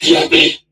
Surprised; Warframe; Corpus Crewmen; Crewman E; Dialog Barks Battle

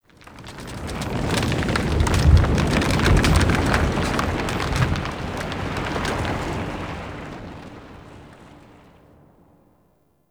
Earth Quake Rumble; Warframe; Tau

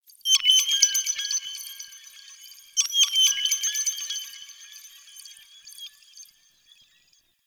Crystal Bird; Warframe; Tau

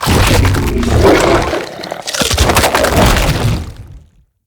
Struggle Left Head Fail; Corrupted Bromius

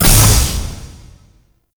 Taller Door Open; Ambience; Gameplay; Warframe; Entrati

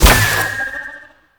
Choir Bow Fire; Warframe

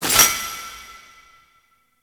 Citrine Crystal Pickup; Warframe; Pickups

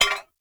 Ferro Pillar Impact Hit; Warframe; Tau; Destructible Dynamic

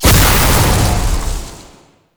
Explosion Medium; Weapons; Warframe; Duviri; Incarnon Adapters

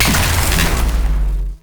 AoE Blast, Pistol; Grineer; Amphis; Weapons

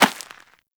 Rodent Hop Step Rear Dirt, Footsteps; Warframe; Characters

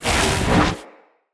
Ground Slam Charge Weapon; Enemy; Abilities; Nimrod

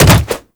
Jump Attack Default; Wall Run; Footsteps

